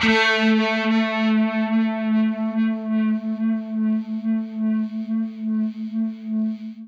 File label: PINK STRAT, Guitar; Quantum Leap; Guitar & Bass